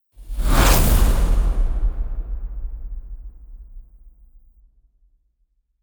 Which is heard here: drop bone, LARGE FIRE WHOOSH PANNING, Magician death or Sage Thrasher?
LARGE FIRE WHOOSH PANNING